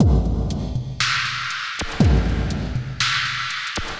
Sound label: Drama Drums (120BPM); musicradar